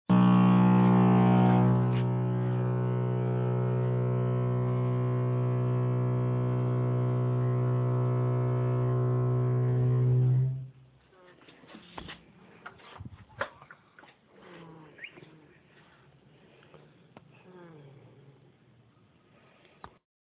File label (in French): bruit chaudiere saunier duval